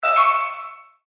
Multimedia Notify, Technology, Multimedia, Notification, Element; Alert; BlastWave